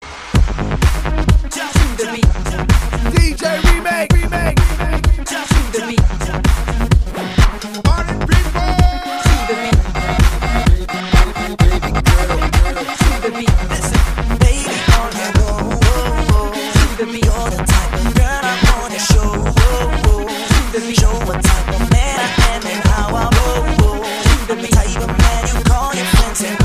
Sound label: Electro